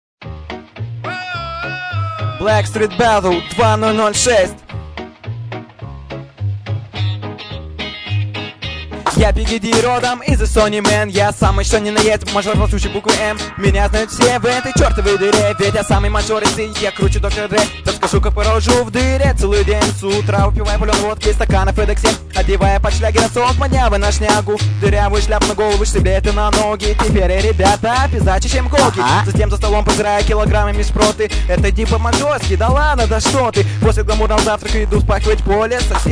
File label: battle; black street battle